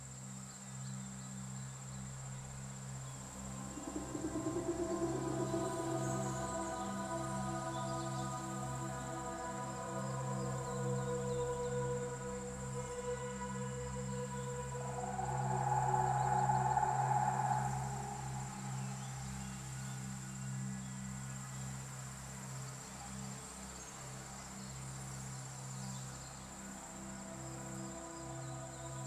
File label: intro free spin; wilderland